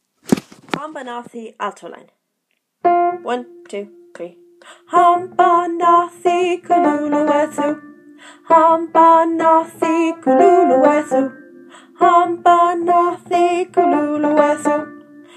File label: Alto; Hambanathi